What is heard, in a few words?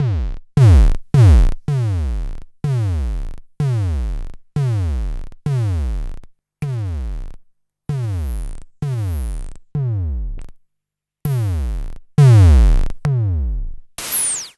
Klone Dual Percussion Synthesiser; KLONE FILT NW8